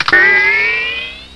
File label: BALLON